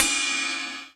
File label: HH, HIHAT HIP, Dance Mega Drums; Best Service